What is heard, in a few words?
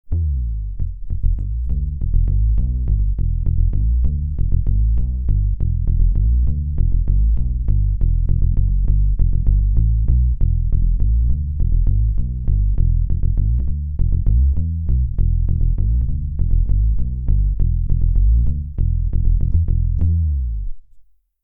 Pick; Kala